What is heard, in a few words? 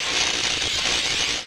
shock fire travel